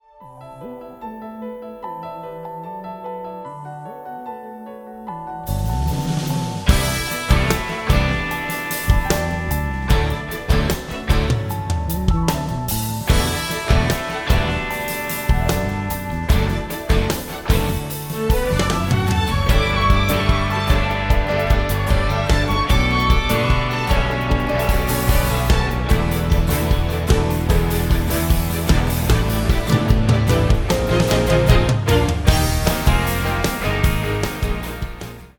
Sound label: Accompaniment